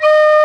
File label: Penny Whistle; Winds; E-MU Producer Series Vol. 7 – Old World Instruments